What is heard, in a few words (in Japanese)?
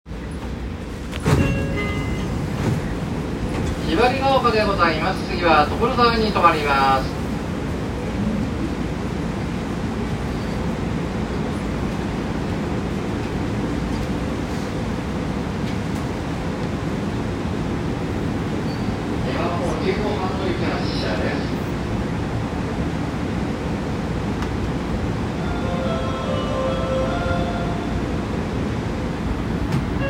西武池袋線雲雀ヶ丘西, train sounds